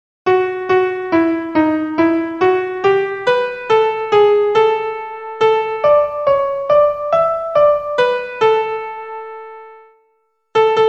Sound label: melody